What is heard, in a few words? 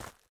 Footstep Dirt; Among Us